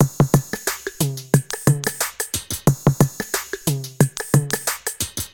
Hip Hop Electronic

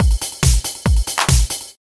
House Beat Loop

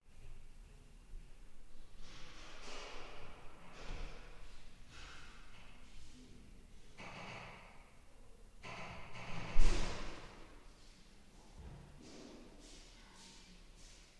Old Stairs Creaking

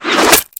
Arrow Hits Target

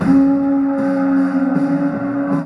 Battlehorn Sound

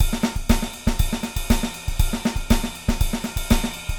Latin Drums

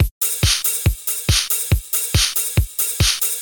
Hard House

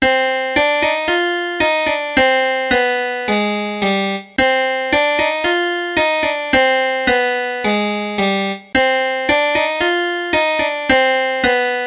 Indian Melody 110

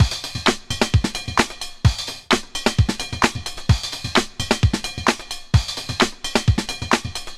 Playful Breakbeat

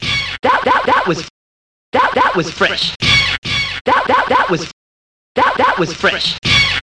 HipHop Fx Loop

